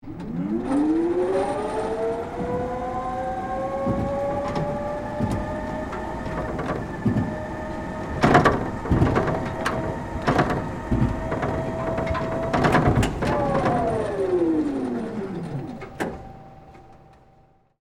Hi Lo Operate, Industry, Hi, Lo, Operate, On, Board, Perspective; Machinery; BlastWave